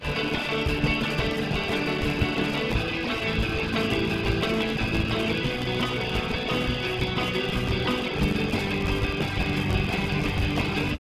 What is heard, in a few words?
groove hard